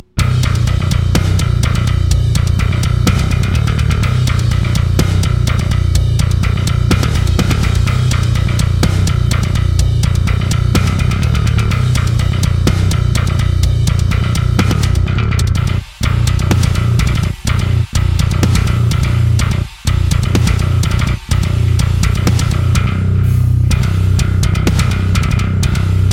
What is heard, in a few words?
pop bass on